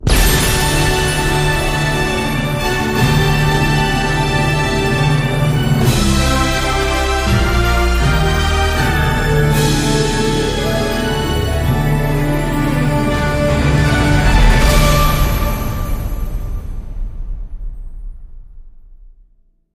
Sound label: mega Win; fairyred